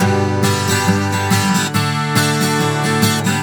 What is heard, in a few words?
GTR, Celtic Flavours; USB Soundscan vol.42; AKAI